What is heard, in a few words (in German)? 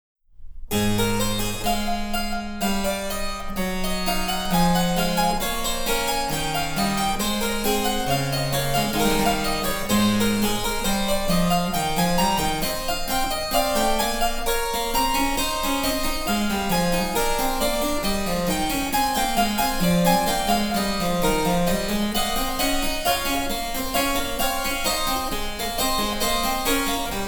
Cembalo; Mark Lindley WTC 1